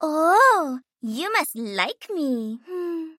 ohhh

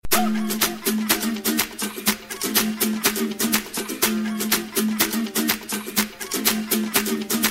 latin instrumental

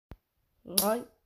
clapped

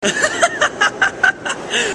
laugh

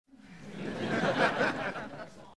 little crowd laugh

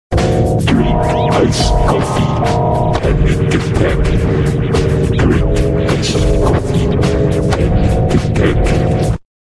panic attac but scary

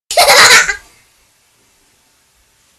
most awesome laugh ever

